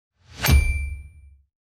Ping test

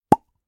avatar every try item

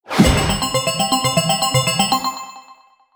exciting gift

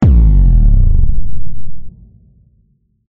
timer boom

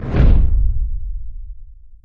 whoosh short

